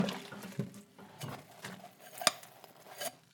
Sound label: Kitchen, Sink, Cup, Wash, Glass Brush; UberDuo; The Kitchen Sink Audio Playset